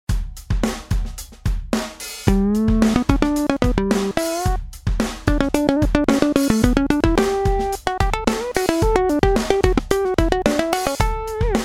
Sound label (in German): Synthsolo; Ausschnitt